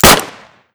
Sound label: Fire; Weapon Package FortWars